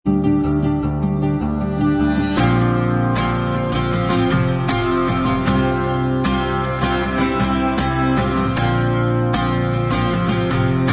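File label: acoustic instrumental track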